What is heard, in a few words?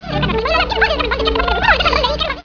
hen talk